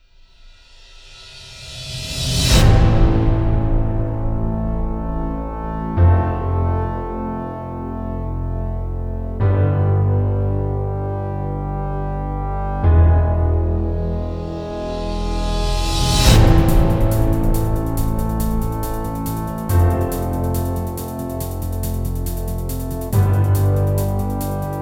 space dubstep sfx

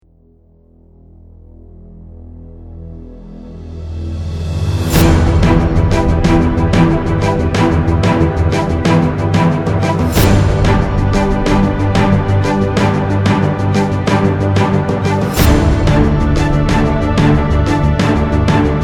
chase orchestra

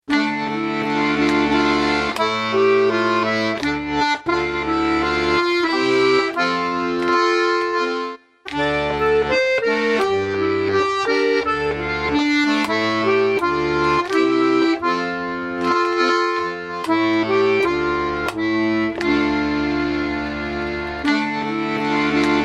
grey accordion

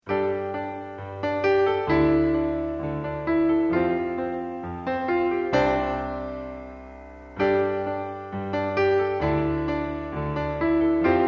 saturday evening piano

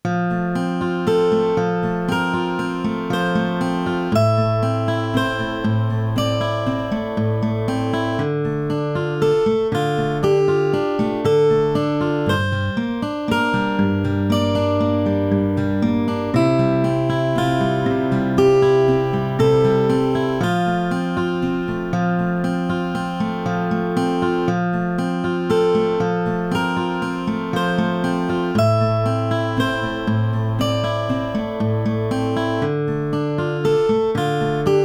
supersweet guitar

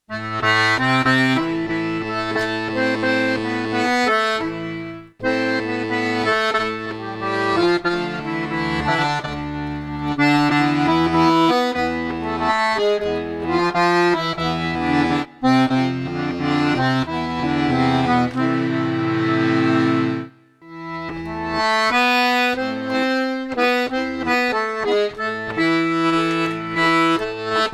white accordion

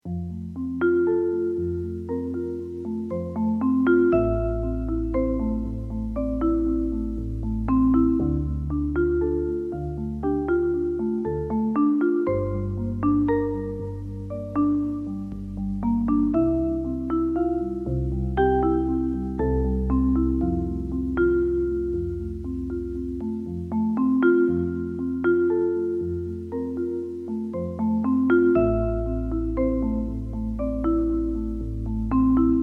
supersweet vibes

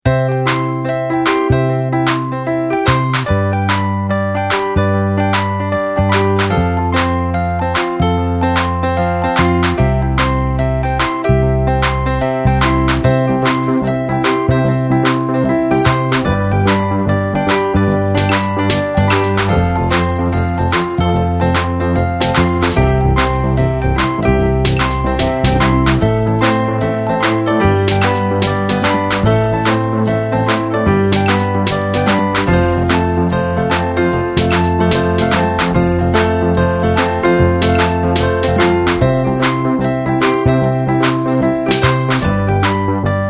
just happy

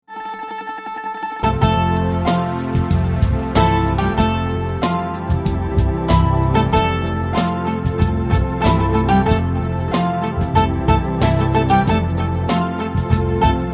greek logo

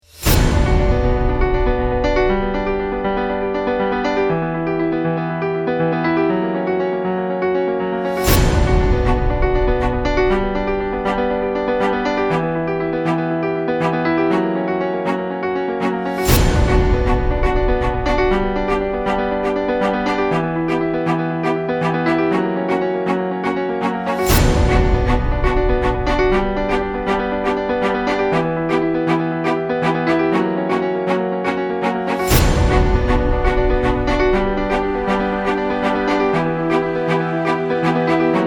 tight strings sfx

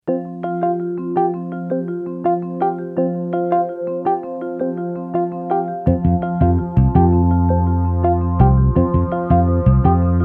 trailer lounge

